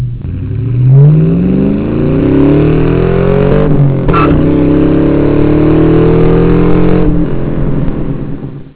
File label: tire chirp